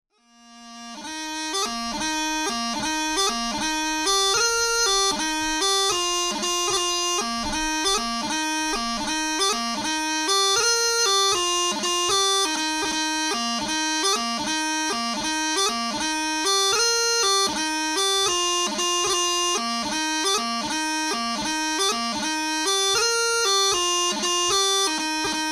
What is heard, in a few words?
Bugle Horn, trad